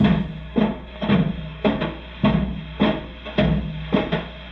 Loop, rhythmate, classic machines

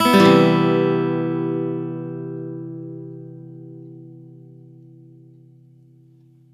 C upstroke, Acoustic Guitar; Sonic Foundry (Sony Creative Software); Songwriter Acoustic Guitar Companion; One Shot Chords

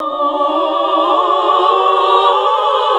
SING, CLASSIC VOX; Best Service; Hallelujah

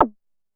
Drum X Zap A, Pearl

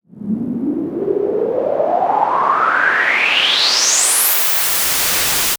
FX, retro house

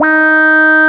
QUAC, QUACK SOLO, Synth

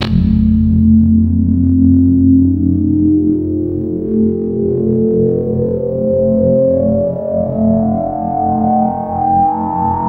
FX, Drum & Bass

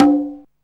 Hi Conga, Alesis Hr16, Drums

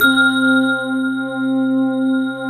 SYN, Roland, digital

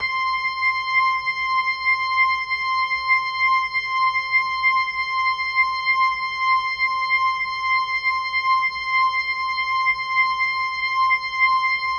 Rotor Riff, Organ

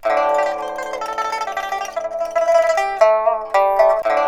PIPA, 90; INIS - Opium

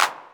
DR. LOOP, DRUMS; X-Static Goldmine 3

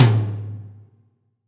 TOM, AMBIENCE TOM

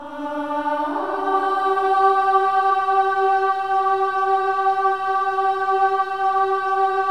INTERVALS, Symphony of Voices; Spectrasonics

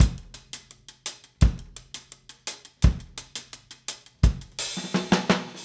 Drums Fill, 70s Analog Funk; Sonic Foundry (Sony Creative Software); What it Is! 70s Analog Funk

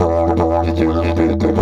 E 150, DIDGE RIFFS

